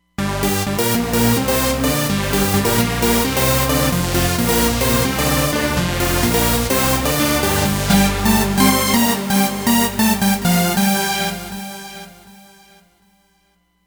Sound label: DOC Stack, Roland Fantom XR